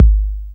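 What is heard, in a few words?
bd, 808 bass drum; Best Service Gigapack 2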